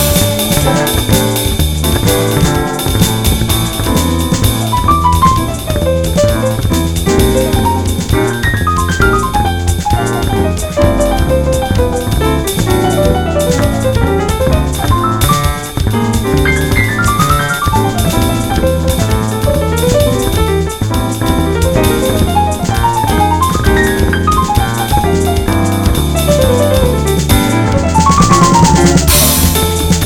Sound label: Samba Song